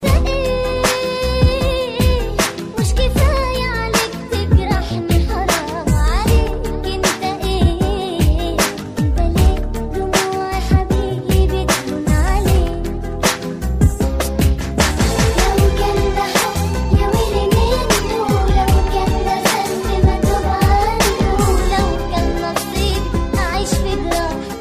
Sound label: nice arabic song